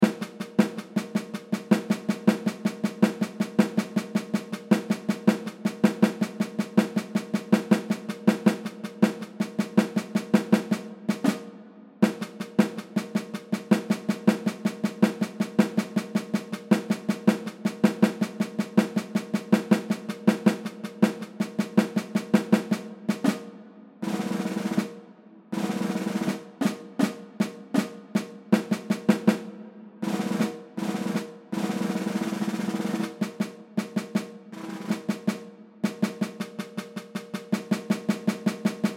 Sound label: add a pop medium